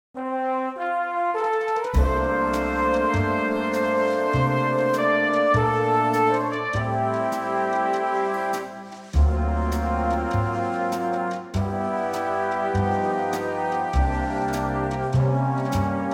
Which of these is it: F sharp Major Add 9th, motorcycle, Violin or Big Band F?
Big Band F